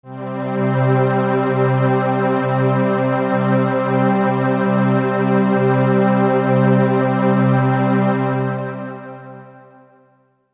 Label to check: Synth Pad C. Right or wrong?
Right